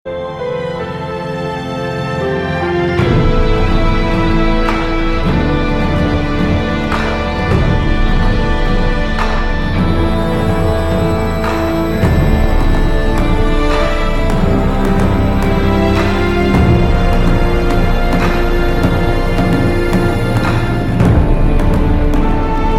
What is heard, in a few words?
eerie twilight music